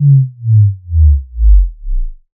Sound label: Sub Drop Basic - Gate